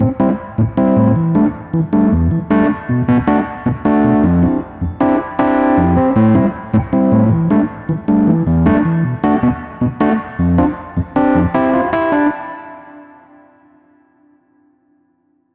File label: BX20 Mono Rhodes